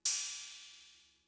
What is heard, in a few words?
Cymbal; Fricke MFB-522